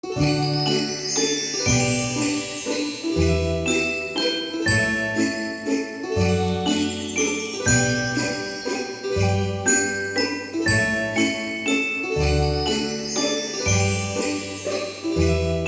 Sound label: THE MAGICAL MUSICAL BOX